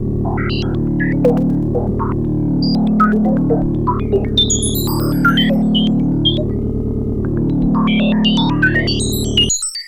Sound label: StarShip Sounds